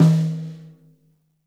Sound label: rack tom - snares on; JazzFunkKit